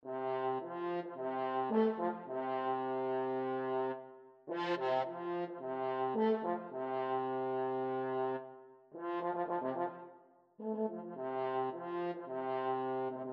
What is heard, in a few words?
last post cavalry, french horn